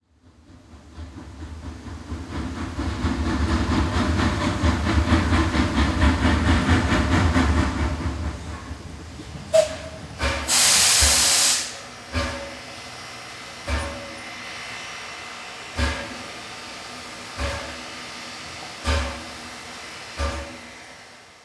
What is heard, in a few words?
steam locomotive arrive and stop